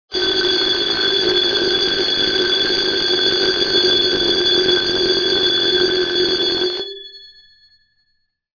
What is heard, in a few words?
Alarm ringing sound; alarm clock